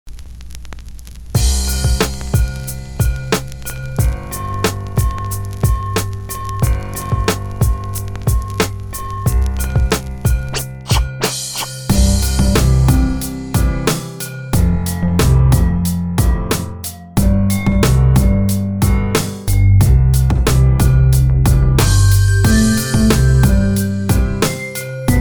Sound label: Happy Birthday to You Hip Hop Version